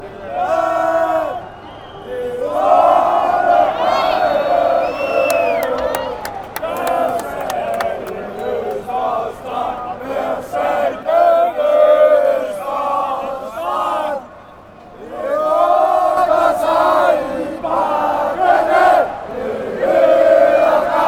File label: chant, fanchants; randers